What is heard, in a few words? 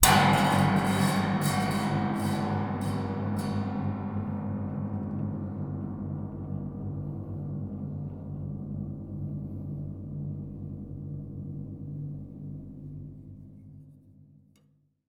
strike short, Cinematic Metal Impacts